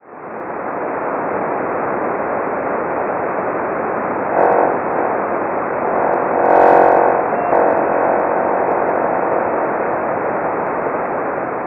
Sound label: Snotel 40.530MHz